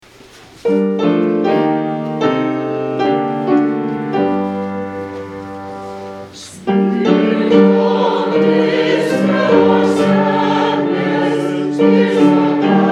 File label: Hymn; Spirit, Come, Dispel Our Sadness